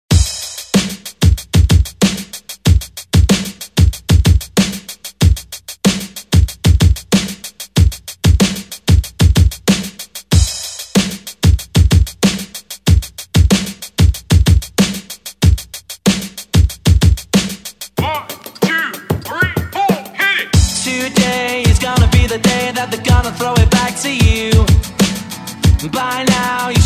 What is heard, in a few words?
Party Rock Mix (94 BPM)